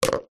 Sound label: sport golf crazy ball drop into hole